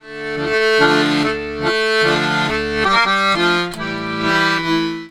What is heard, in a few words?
Accordions; USB Soundscan vol.40; AKAI